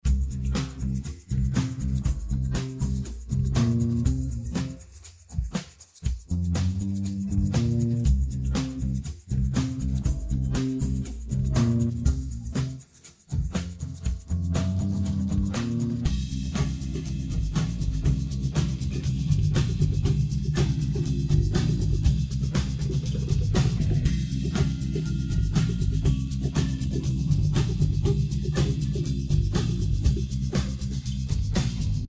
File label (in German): nur bass und percussion